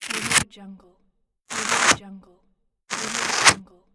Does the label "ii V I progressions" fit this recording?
No